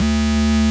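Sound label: DISTORTED SYNTH D-2; AKAI S-Series CD-ROM Sound Library VOL-7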